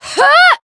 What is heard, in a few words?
Voice attack; orleya